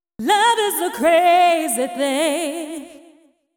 deep house female, 125, A, vocals

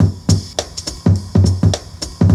RIMLOOP 106, BPM; 100-114 BPM

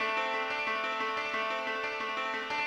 ETHNO 90 D

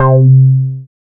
BASS MINIMOO

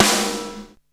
SD CHOICE, snare drum; SNAREDRUMS

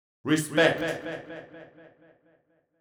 deep house male, 125, A, vocals

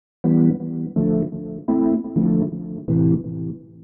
DEEPHOUSE ORGAN 125 A

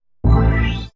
HOUSE FX 125

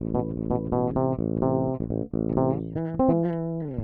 DEEPHOUSE BASS 125 A

